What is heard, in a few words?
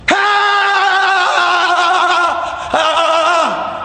screaming; elliot hulse